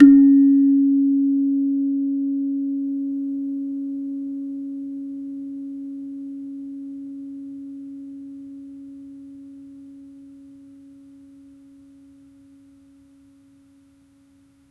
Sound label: a single kulintang sound, world music